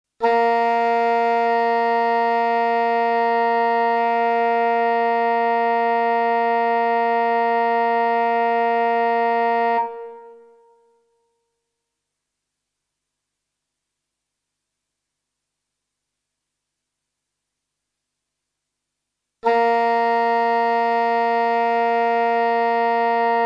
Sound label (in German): Stimmton B; Blaeser-Team